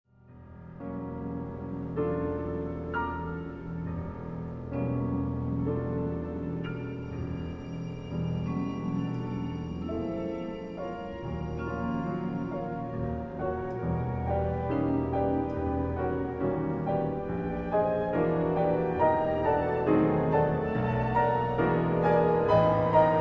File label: Piano